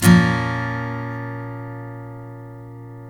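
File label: steel A# minor up, CHORDS STEEL, Guitars; Spectrasonics; Hans Zimmer Guitars VOL-1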